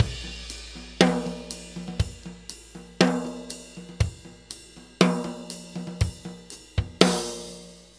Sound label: New York Loop, Drum Tools, Laidback